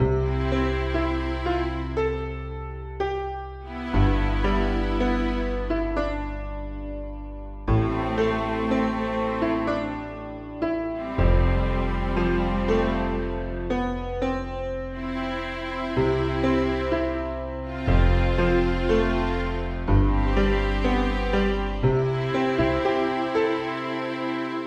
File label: Melancholic Mayor (GeneralMIDI); ms-dos; sim city 2000